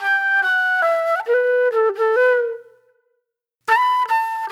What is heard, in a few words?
Fusion Flute; Sonic Foundry (Sony Creative Software); Manhattan Flutes; Garage Funk